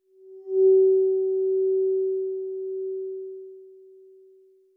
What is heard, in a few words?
crystal note, wine